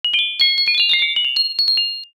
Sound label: sparkle long